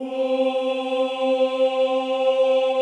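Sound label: choir C3, Ooh; Ooh 2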